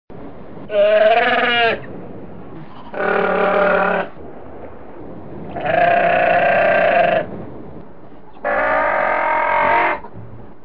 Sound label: northern fur seal